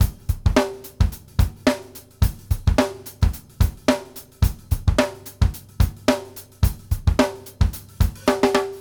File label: BASIC B, 108; Sampleheads; New York City Drumworks VOL-1